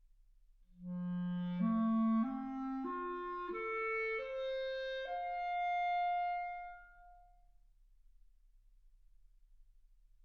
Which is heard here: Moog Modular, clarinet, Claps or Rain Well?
clarinet